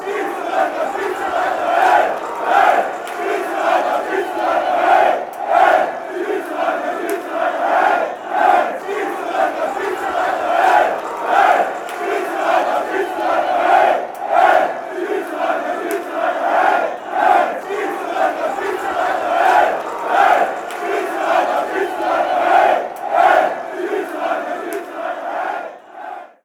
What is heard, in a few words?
hey hey fan chants; hertha